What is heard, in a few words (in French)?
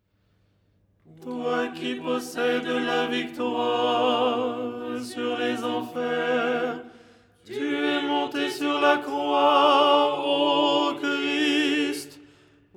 tenor; Au lucernaire, 1ère stichère du dimanche; Les 8 tons en français; ton 6